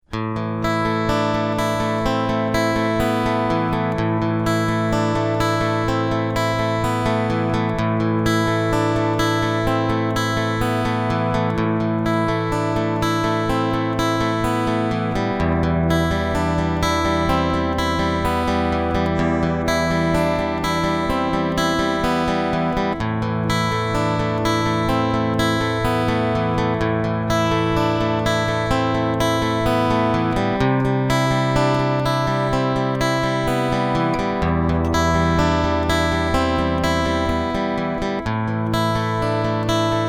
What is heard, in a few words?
instrumenal